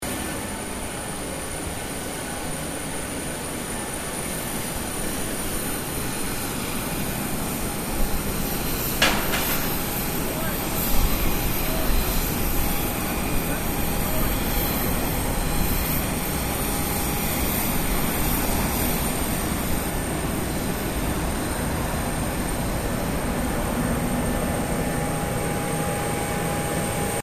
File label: ambience tarmac sydney airport loop stereo